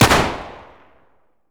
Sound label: 1911 fire; pistol m1911a1; garrysmod